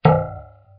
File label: Bounce; UltEvents